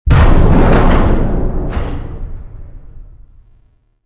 door huge stop; movers

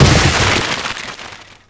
hit plastic fence; hits; Tony Hawk's Project 8; XBOX

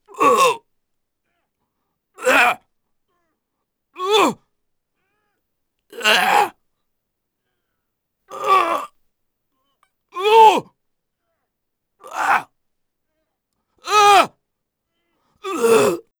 HARP

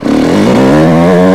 throttle low, YAMAHA

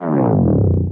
fall

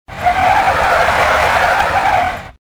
tire squeal; Soldier Of Fortune II Double Helix; XBOX; ambience

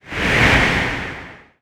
Replay Woosh; Championship Manager 2006; XBOX

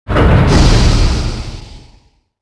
huge plat start, platforms movers

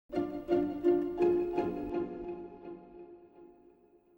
MUSIC pizz; Myst III Exile

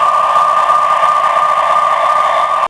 Police Weo Siren Distant; cars; Max Payne

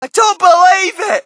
crowd, shout; Mike Tyson Heavyweight Boxing

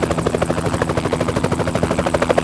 heli; Colin McRae Rally 3; XBOX

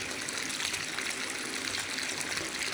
water trick; Soldier Of Fortune II Double Helix; XBOX; ambience

